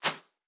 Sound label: Footstep Sand Running